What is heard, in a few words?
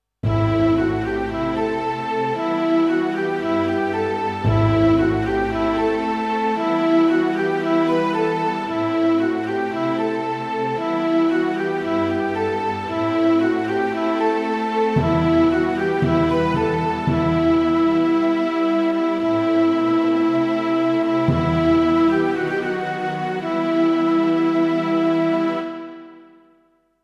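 Em Riff small orchestra 114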